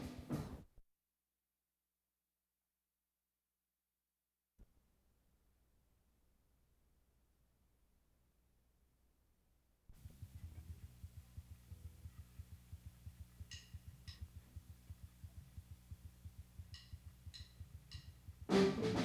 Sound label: guitar